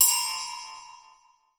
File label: SPINNIN, METALLIC FX; Voice Crystal; Steve Reid Definitive Percussion